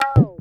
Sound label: tape fx, scratch; Ambient Grooves